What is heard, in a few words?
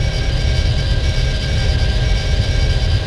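cockpit loop, ambience; desann; Star Wars Jedi Knight II Jedi Outcast; XBOX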